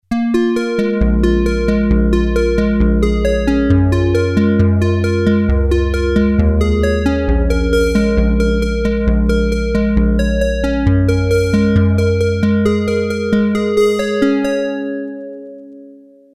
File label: synth squared, Roland alpha juno